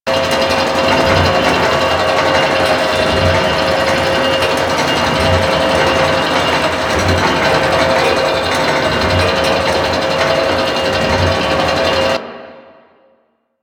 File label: Shaking Metal Machinery Loop; Ambience; Props; Warframe; Entrati